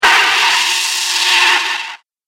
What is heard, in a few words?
Electric Static Hit; Static Sound Effects; Andrew Fleming